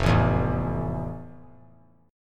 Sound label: F Major 7th F; strummed; JGuitar